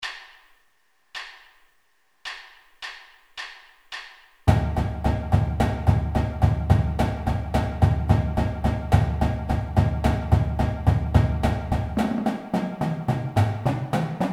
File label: bucks medium, marching drum warm-ups